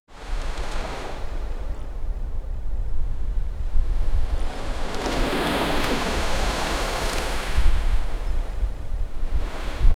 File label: jeep moves at beach